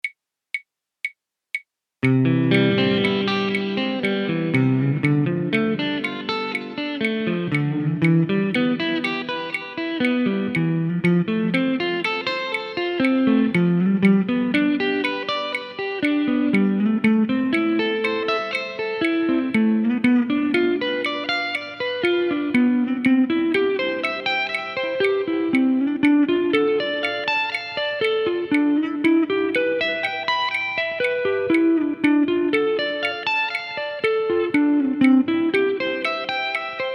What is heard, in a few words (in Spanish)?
con swep picking